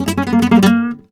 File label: f#, Flamenco Guitar Picado; Picado 1